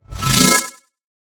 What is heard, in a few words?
Fast Warp In